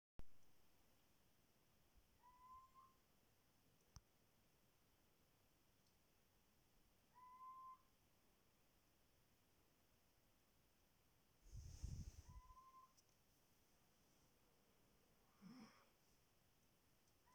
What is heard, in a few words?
bird up